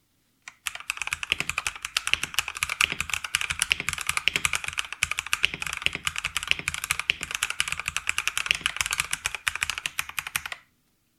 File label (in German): SGK50 S3 Custom Tippen